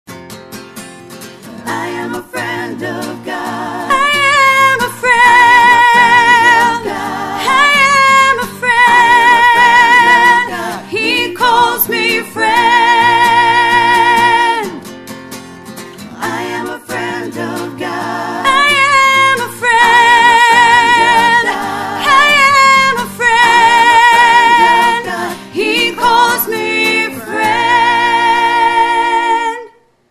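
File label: friend jude soprano end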